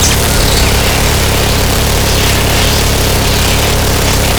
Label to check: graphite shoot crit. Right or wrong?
Right